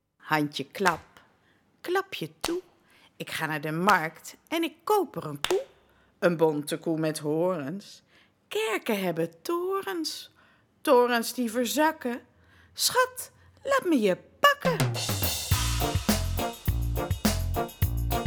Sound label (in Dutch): Handje klap